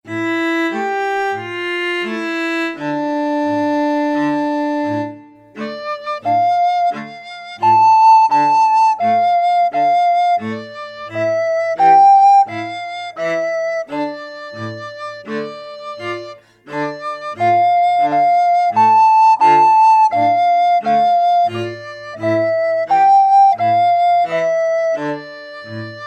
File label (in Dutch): Klezmer festival